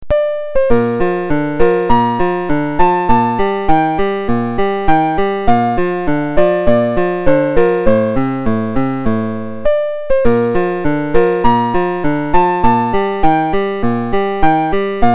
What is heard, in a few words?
PIANO